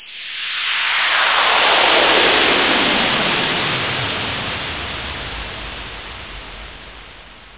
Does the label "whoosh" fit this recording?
Yes